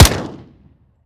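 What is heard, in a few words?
uzulu1911 fire player, weapons; gmod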